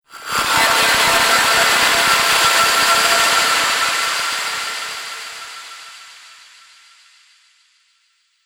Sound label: Laser Future Majestic; Laser Sound; Sci-Fi Laser